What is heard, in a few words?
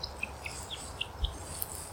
American Woodcock Fight Call